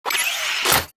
arc panel small deactivate; Titanfall 2

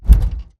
bt footstep jog parts; Titanfall 2

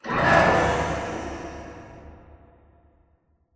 Robot Arm Track Speed Change Dist; Boomtown; Titanfall 2

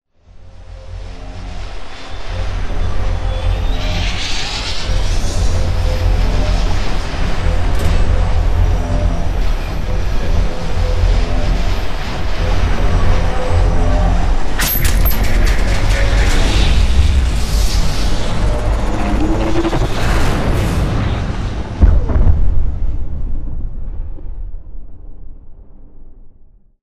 hover; colony; stratton; Titanfall 2

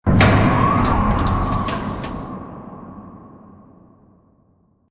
Giant Floor Panel Arrive Start; Boomtown; Sideways Town; Titanfall 2

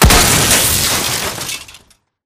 bullet generic exploding head kill shot android; Titanfall 2

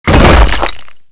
concrete shotgun bullet impact; titan; Titanfall 2

